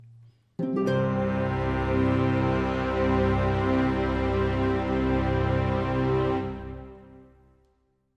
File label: Short Sting